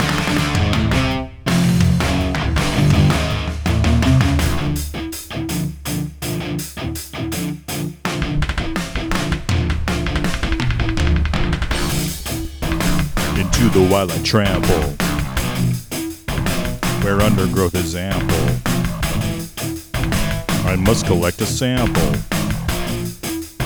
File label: Scratch Vocs